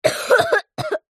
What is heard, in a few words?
human child boy 8 cough